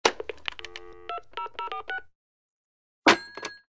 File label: hangup; open toontown resources